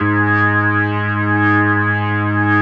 B3, First Call Vintage Keyboards

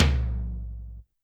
Tom Hard, YAMAHA RM1X

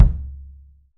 KICK, 3D Drums; AXIS KICK

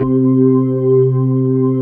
B3 HAM, Keyboards Old School

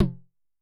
TEKK 2F, Tom, Yamaha DTXpress-II

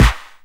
KIT, Dance Mega Drums; Best Service

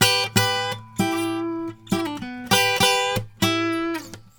Blues Guitar Samples; ILIO; Fretworks; 96 HIRUM LICK

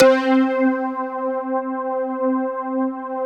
SYN K-2000, Roland; Sound & Vision - Gigapack I CD 2

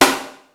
Ensoniq EPS Snare

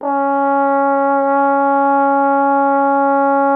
Trombone C#0; Miroslav Vitous - Solo Instruments VOL-1

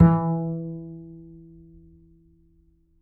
mf G, G string upright bass; Upright Acoustic Bass

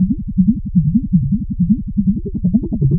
Noise, LFO & Noise

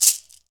SHAKER, Afro Shakers, Shake&Rattle

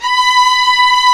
STR, Vlas Sect, Agitato; STR Vlas Sect1-2; STR Vas1 Agitato

